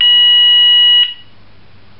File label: intermittent, fire alarm; garrysmod